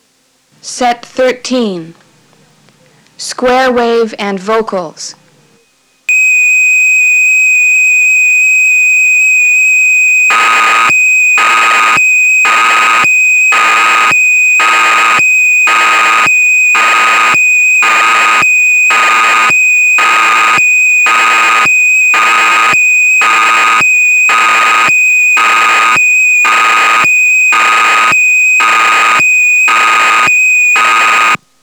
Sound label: Square Waves And Vocals, dx9